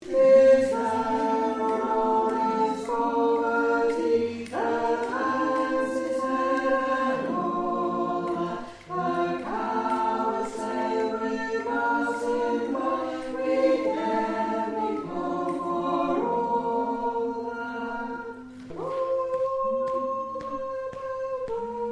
amansaman Ten

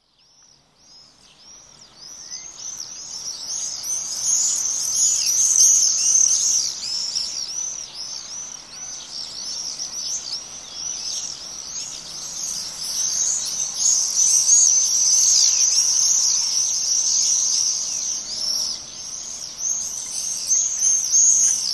Swift, birdsong; Martinet; bbc Bird Song Site

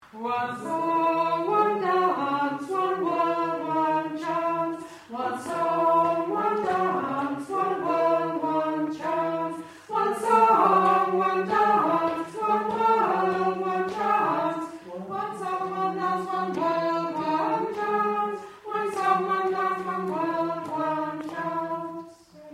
one song one dance sop

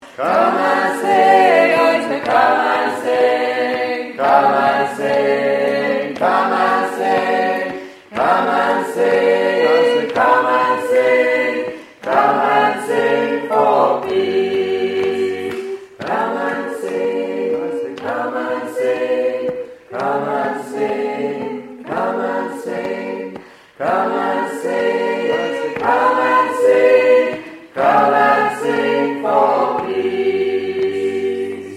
Come And Sing All

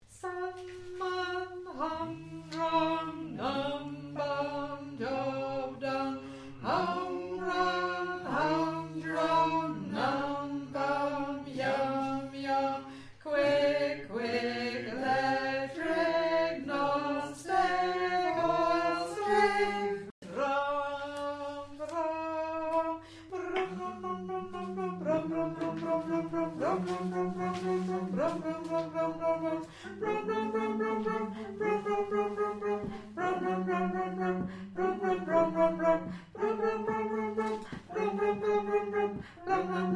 Bass

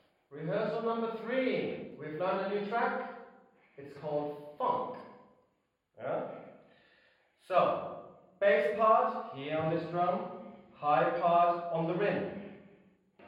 samba Intro Funk

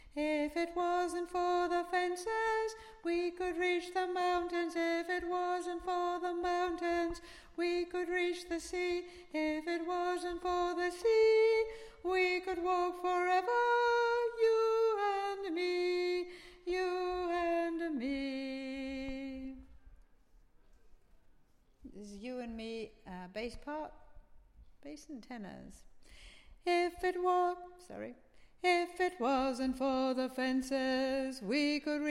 Alto

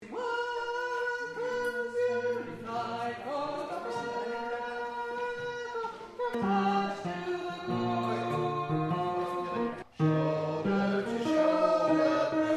Tenor

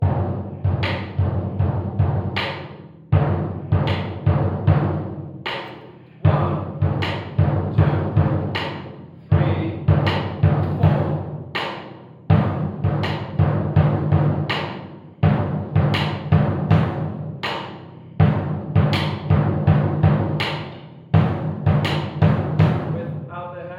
samba Low Bass Funk

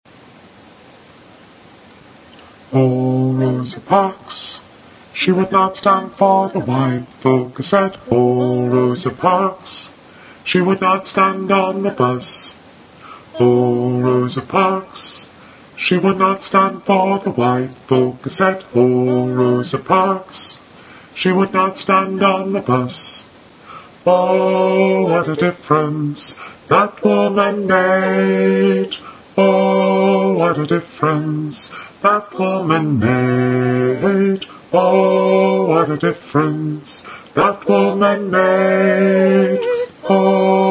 bass

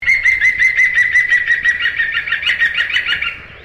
Green Woodpecker, birdsong

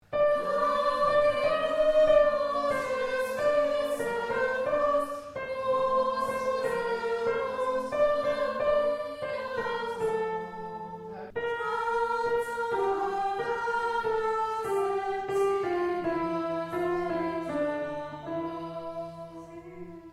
Tune

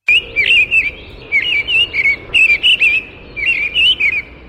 Missell Thrush

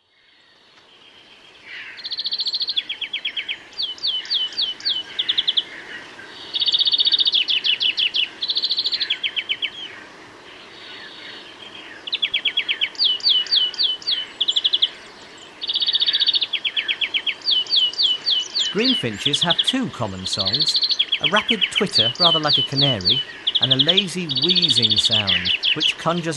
Greenfinch; Verdier; bbc Bird Song Site

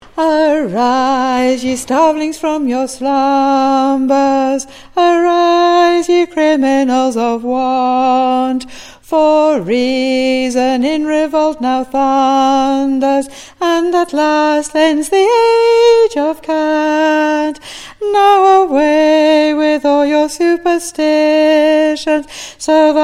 Alto

